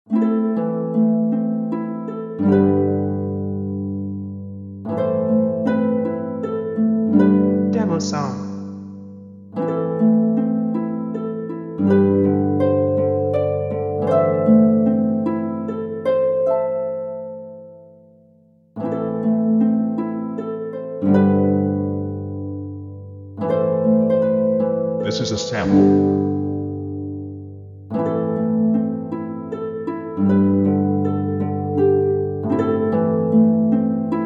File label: Bards Solo Harp